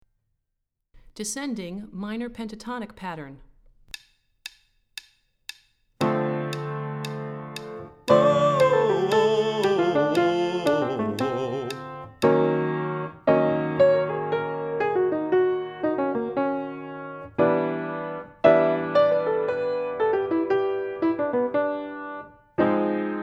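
Descending Minor Pentatonic Pattern - High, Vocal Warm-ups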